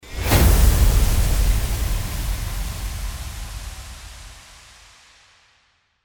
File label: IMPACT